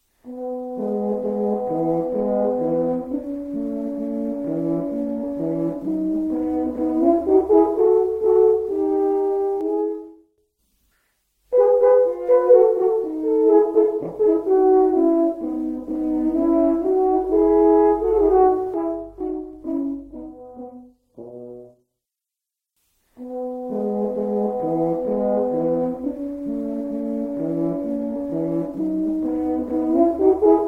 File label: adagio, 2 horns; Stich J.V.: Duet Nr. 2Adagio